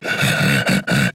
snork idle; mutants; STALKER; customnpcs

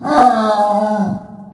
karlik take hit; mutants; STALKER; customnpcs

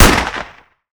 pmmd90u fire; STALKER

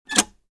switch off; STALKER; minecraft

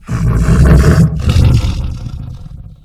snork attack; STALKER

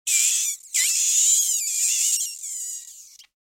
rat death; STALKER; customnpcs

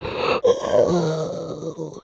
zombie death; STALKER; customnpcs

